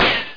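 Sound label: ricochet; Pamela Anderson Interactive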